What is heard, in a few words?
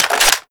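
weapon foley m4 reload clip in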